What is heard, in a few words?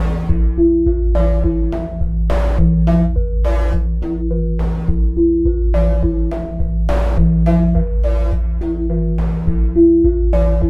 Sine Wave, Processed Percussion